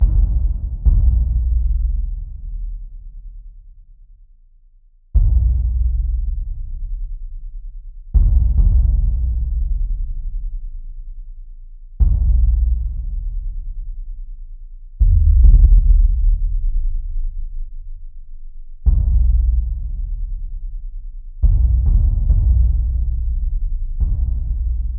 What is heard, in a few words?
Deep Drum Bed